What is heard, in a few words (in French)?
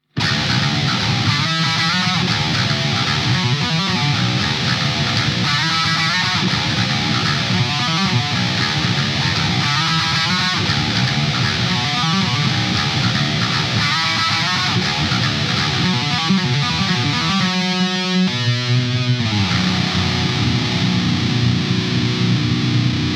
RIFF EN B; Damien Elite